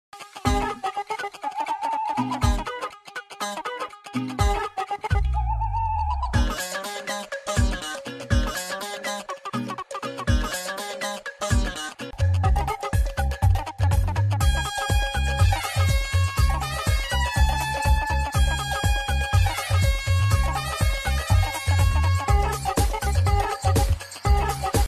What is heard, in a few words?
hero heroine funny bgm ringtone; raja raja chora